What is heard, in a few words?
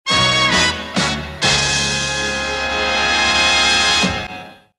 Coolest Sms Tone